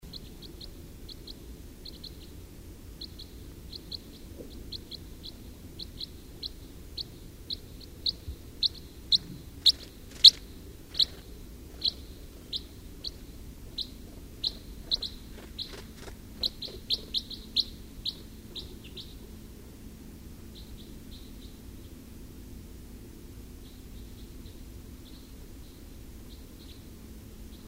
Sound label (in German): Fichtenkreuzschnabel; Ruselgebiet